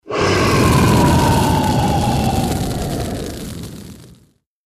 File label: Fire Breath Dragon